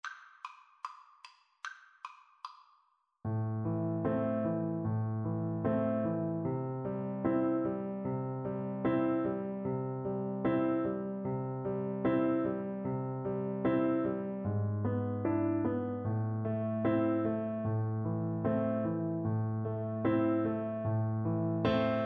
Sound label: el condor passa Recorder